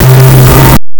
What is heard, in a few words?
single stone prayer bead, meditative